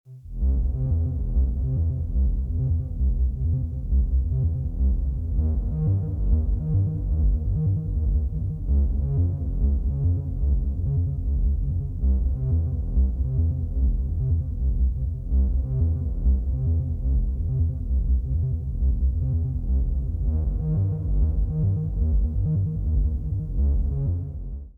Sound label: menu